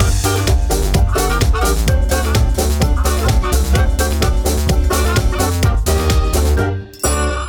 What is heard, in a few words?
Jewish Dance